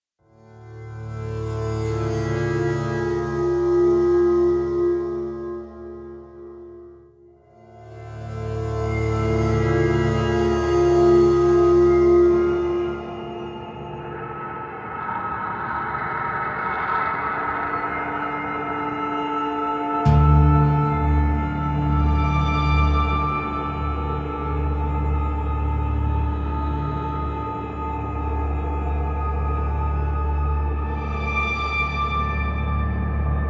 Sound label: Sewers Exploration; Novigrad